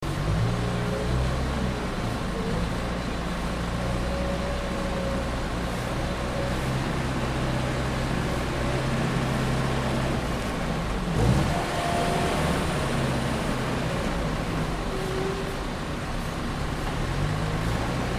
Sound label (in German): naechtliche baustelle; tiefbau